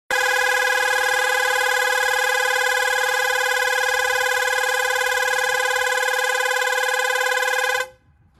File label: Italian double horn